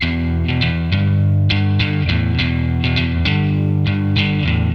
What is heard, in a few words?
101 E Guitar Riff Thing, Melodic Loops; Electronisounds